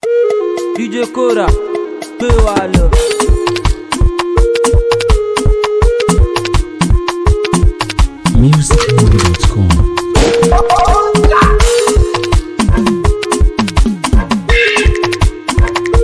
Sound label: Flute, beat